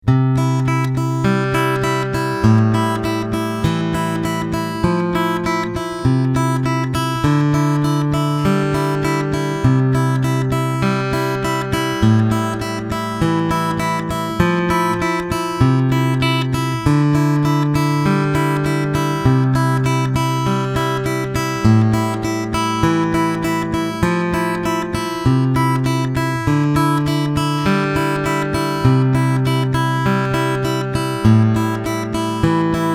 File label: Fingerstyle, acoustic guitar; open string guitar fingerpicking patterns